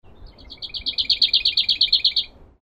lesser whitethroat; totteridge

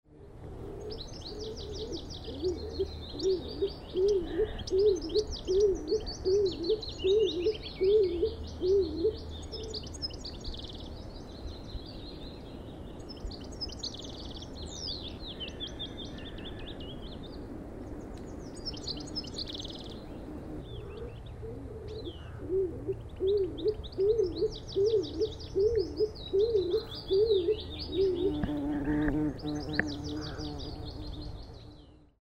stock dove

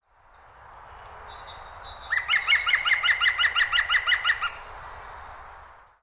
green woodpecker

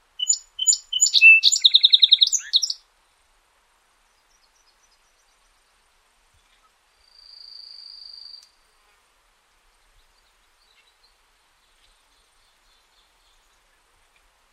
song sparrow

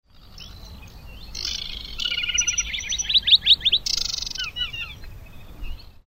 aquatic warbler

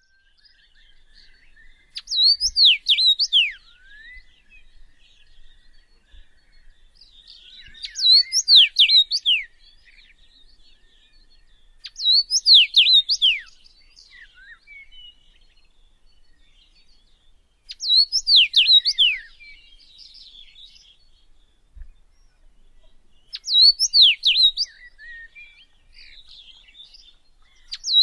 common rosefinch

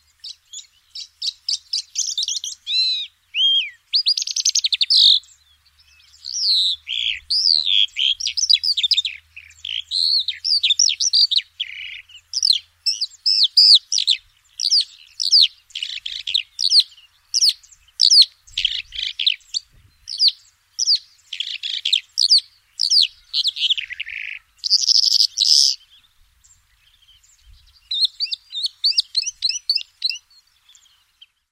calandra lark

